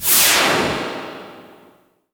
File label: A#3 NOISZ; RED VOLTAGE